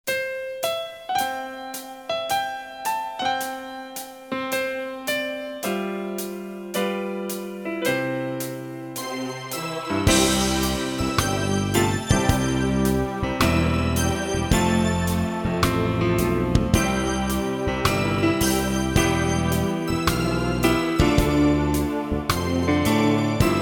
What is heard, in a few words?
Bongos Karaoke play along